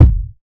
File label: dry kick drum f sharp key